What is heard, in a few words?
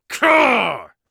angry, Warrior Voice; Warrior Voice Pack